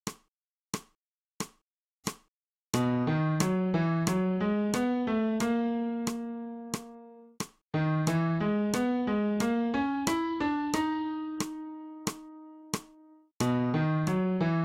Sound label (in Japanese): 新フレットレス